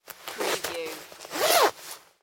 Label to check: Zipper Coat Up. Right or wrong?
Right